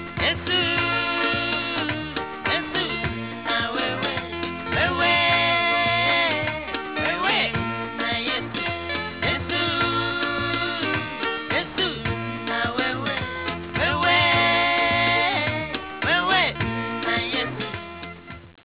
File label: chorus; yesu